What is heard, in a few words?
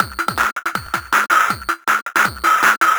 160 Manic Street Fx, Drum Loops 160bpm; Zero-G; Soundclash Nu Dance Electro; Acidized Wavs